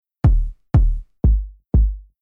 RnB Kick, Rhythm

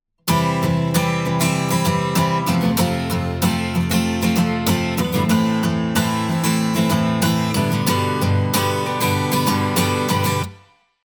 guitar 16beat

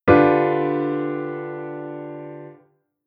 Chord Inversion 2nd Inversion

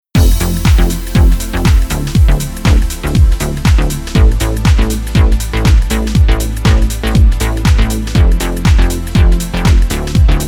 Synth Side Chain Off Pad

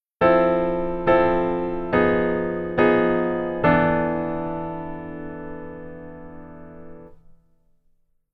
Keyboard Voicing Rootless Pf Only

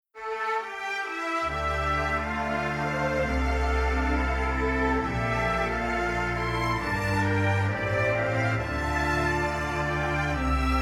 Strings Only; Strings Poly Sample

